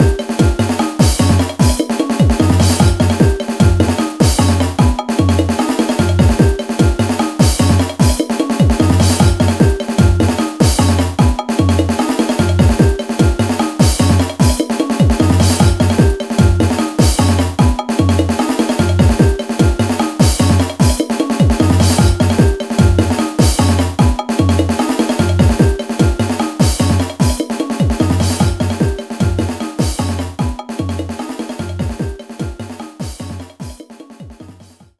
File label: Battle